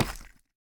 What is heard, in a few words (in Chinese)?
深板岩踩踏声